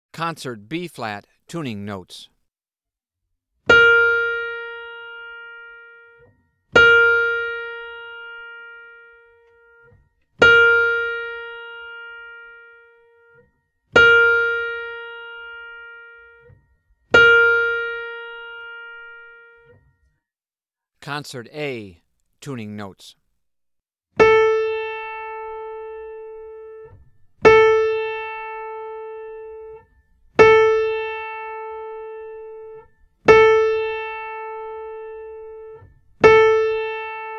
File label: Tuning Notes; James „Jamey“ Aebersold Play-Alongs